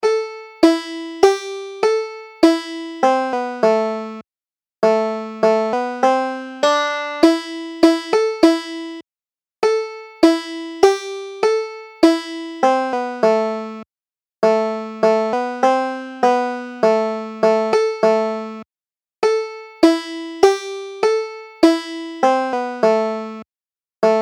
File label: pretty little dog, banjo